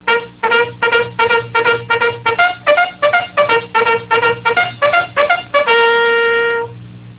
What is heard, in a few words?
bugle